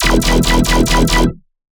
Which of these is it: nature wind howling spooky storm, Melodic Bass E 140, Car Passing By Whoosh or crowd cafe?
Melodic Bass E 140